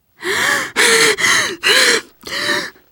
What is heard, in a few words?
exertion critical, teen girl; player; survivor; voice